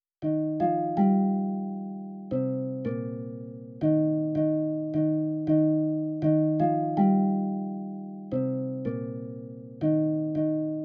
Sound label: sinewaves